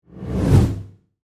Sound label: Whoosh Medium, Fast, Accent, Transition; Production Element, Imaging Element; Whoosh Sound Effects; BlastWave